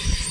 subway sound; Subway Sound Construction Kit

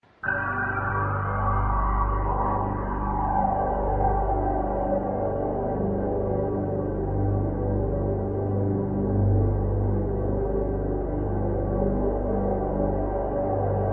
astral glide, Synth

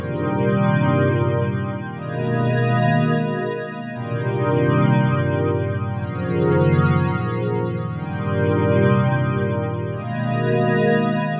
120 alien friends pads; SYNTH AND ELECTRONIC LOOPS

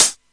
909 squoosh hat; DRUMS (SINGLE HITS); Hats

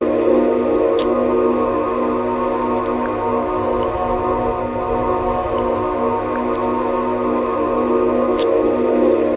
big echo space; Pads

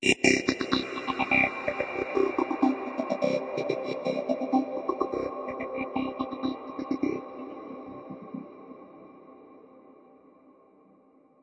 126 space banjo

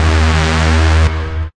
hard edge bass; Basses

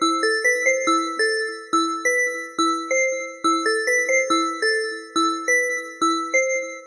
140 analog bell melody; SYNTH AND ELECTRONIC LOOPS

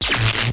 Laser, Cheesy Lo-Fi Sound Effects

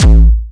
Syn Dr, Overloaded Synthetic Drums; style kits

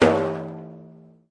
arabic perc, Arabic Percussion Kit; Arabic Percussion Kit 2

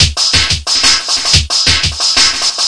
180, drum loops and breaks; 161 to 180 bpm

